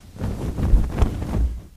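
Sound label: Cloth Rustle Heavy Quick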